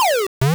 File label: Smoke Screen